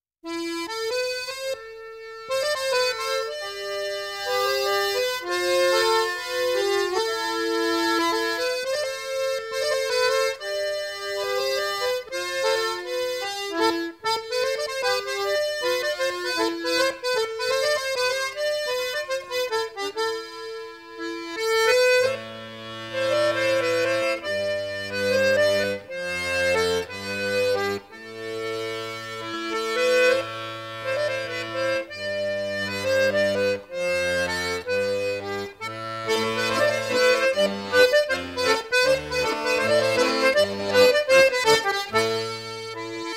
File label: Gavotte Bal; Bro Dreger